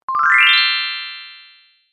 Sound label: kirarin